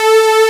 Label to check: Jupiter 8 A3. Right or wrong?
Right